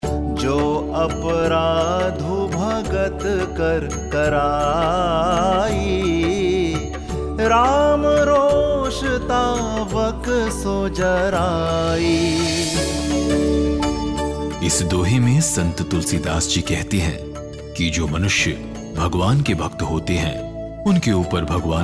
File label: kabir ke Dohe Hindi